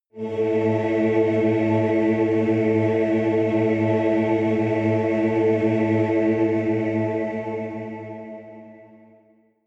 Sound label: demon choir one note low